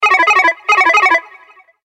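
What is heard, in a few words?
nice guitar sound; blues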